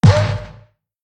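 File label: giant hound damage, monster; Senillinea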